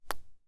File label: Weapon Bullet Shell 9mm Drop On Sand; AdobeCC